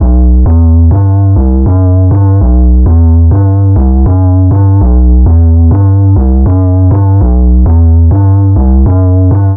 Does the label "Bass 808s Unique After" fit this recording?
Yes